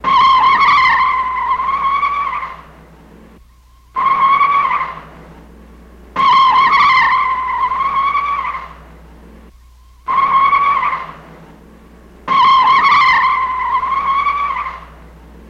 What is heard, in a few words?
Car Skids On Cement